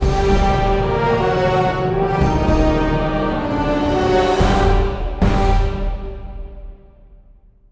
Jingle Fall; Ankh; Osiris

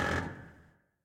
Creak Metal Medium

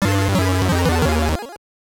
Losing; Prince Naseem Boxing; GBC

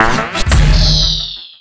Boss Attack

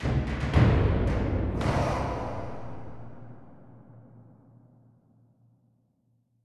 Music Fight Vikings Theme Easy End; asterix obelix slap them all 2

